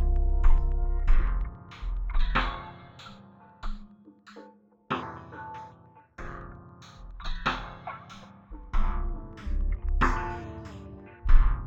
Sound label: HRTF Sweat Monster